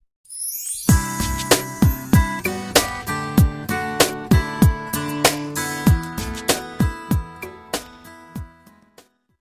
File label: easy n smooth